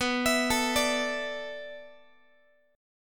B Minor 11th B down Guitar 4A 4E 5A 5D 5G sharp 6C sharp; arpeggiated